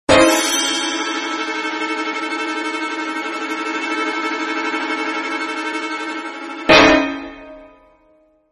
Witch spells; Jack; Rapunzel

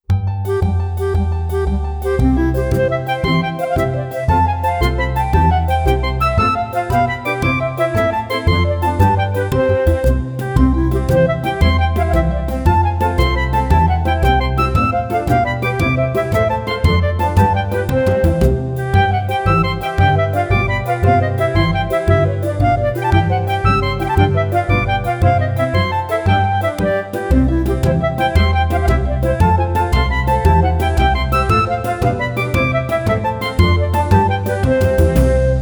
Springs Continuous

